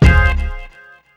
Hit

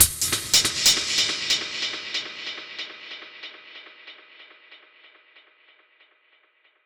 SPACE DRUMMA

